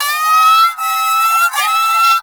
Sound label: Armadillo Player Lure Call; Warframe; Venus Animal Lure